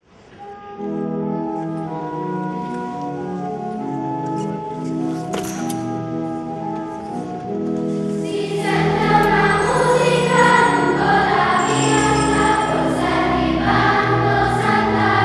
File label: school Choir; Filastrocca Di S Lucia